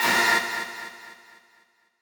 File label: stab